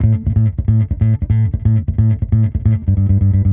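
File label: Electric Bass Neck Finger 136 A1; musicradar; bass boutique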